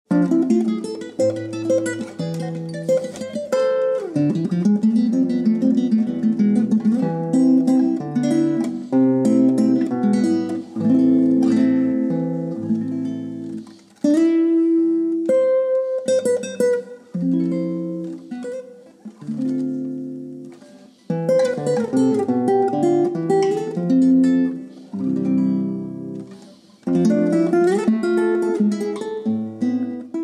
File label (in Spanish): Guitarra